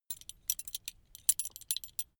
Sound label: metal belt buckle clatter long soft